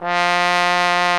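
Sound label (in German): POSAUNE VIBRATO; Best Service; Alpine Volksmusik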